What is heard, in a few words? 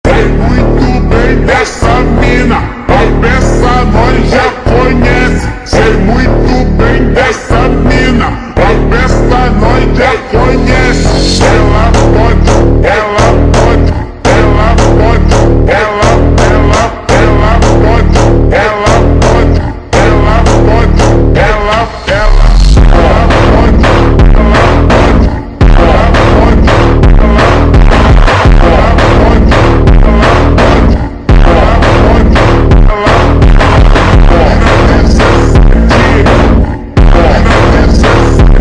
slowed